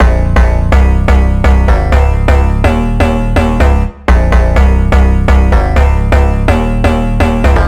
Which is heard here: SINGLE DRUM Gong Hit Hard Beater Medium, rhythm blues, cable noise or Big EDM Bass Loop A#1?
Big EDM Bass Loop A#1